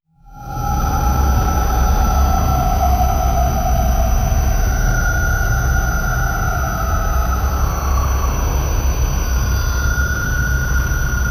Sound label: background sound, halloween